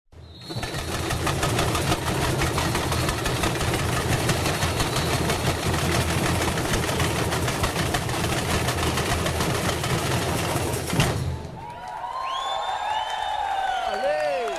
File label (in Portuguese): Break Viradouro Rio Ao Vivo